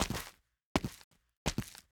walk

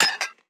ui dish mix normal

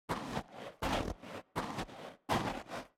snow boot walk

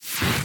fgun

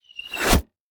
arrow

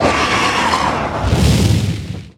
fireball

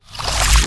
vase

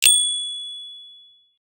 monocycle bell

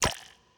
water mag

